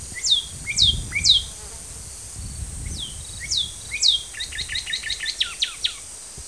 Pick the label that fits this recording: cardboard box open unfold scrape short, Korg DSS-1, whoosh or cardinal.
cardinal